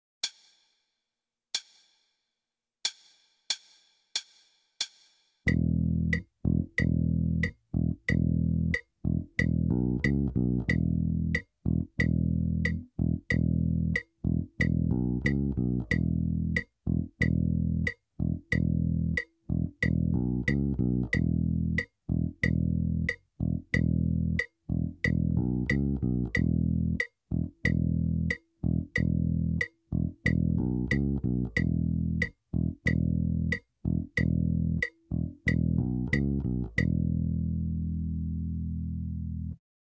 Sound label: Rock